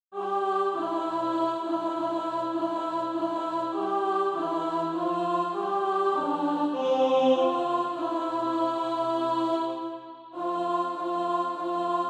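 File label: alto, Practise Music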